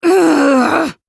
"ugggh"; tokoae

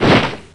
woosh coat

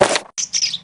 book open old

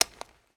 unclasp; nahyuta

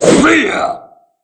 slash

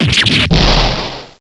fan; octavia

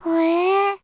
"hue"; plutia

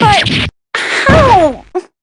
collapse; weiss

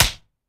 point

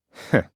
heh; byakuya

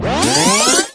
rewind

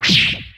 arm

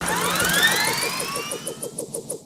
drone start; armie

